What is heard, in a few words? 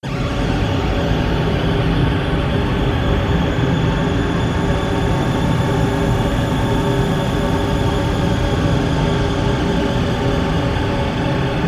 sweep